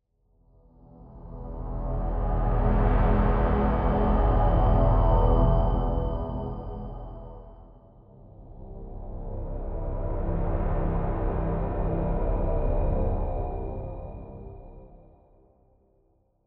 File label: Drones; Nucleus